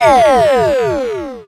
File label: Multimedia Internet CD-Rom Flash Wipe Electronic